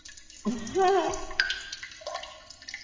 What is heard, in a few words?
Peeing look back; Tom